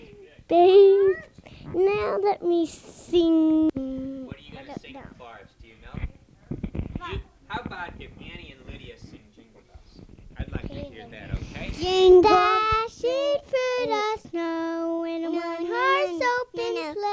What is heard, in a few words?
Jingle